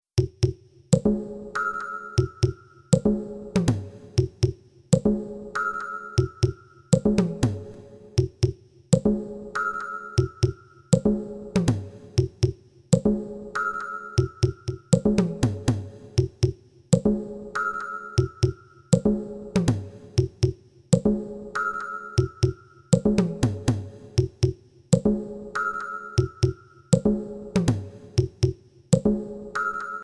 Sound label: only melody